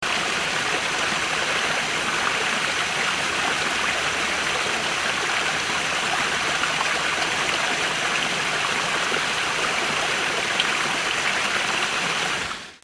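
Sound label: babbling brook; seseragi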